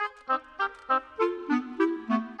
Clarinet Oboe, Orchestral; Sonic Foundry (Sony Creative Software); Orchestral VOL-2; Modern 18

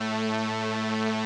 505, String Machines; Best Service; XX Large Most Wanted 2; PARA-505

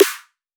random snare

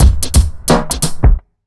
88 beat, Underground Hip Hop; USB Soundscan vol.02; AKAI

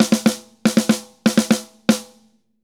Drums Poly 120, Drums & Percussion; Best Service; Festa Latina VOL-1

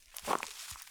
Rustly Step, Footsteps, outdoor

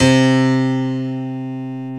PNO, SY PIANO, House

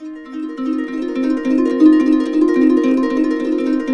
C, Harps; Complete Harps; USB Soundscan vol.62; AKAI

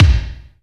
Reverb Kick Snare; PS-37 Dance Synths; Percussion

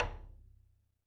Roland TD-20 Percussion

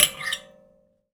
Finger Hit, Prepared Kit; Battery 3 Sample Kits

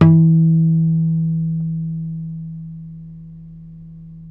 NYLON HARM E, GUITARS; Synclavier Sampler Library

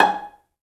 Cell Pz G#5; Digital Sound Factory Virtuoso

